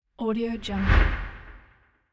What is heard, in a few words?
impact sweep transition; music-fish